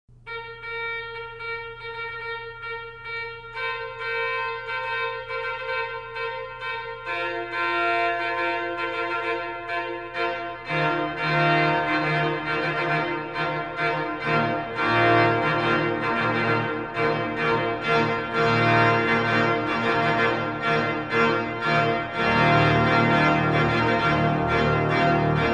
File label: Fanfare, organ